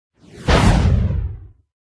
cerrador buff, pets

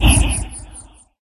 ling wound

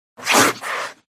cat attack voice middle

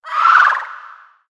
wound

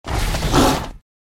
Tiger Dodge

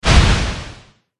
mage attack contact up

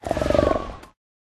IceBear Dodge

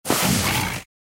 Tiger Dodge

